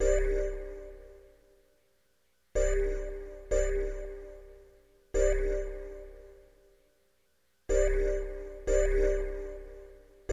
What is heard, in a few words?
SYN, Hip Hop and R&B; Best Service ProSamples vol.02; AKAI